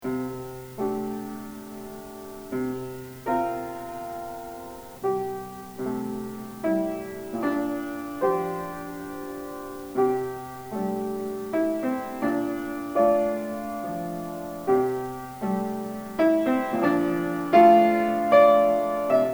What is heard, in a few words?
loop